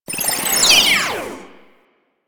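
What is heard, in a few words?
Sentient Conduit Projectile By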